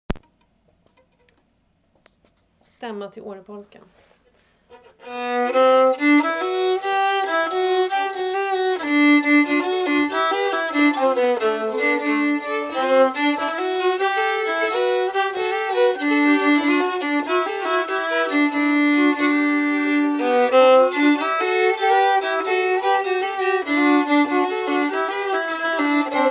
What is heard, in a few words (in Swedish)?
Stämma Årepolskan